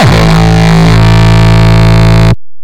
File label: Longkick, kicks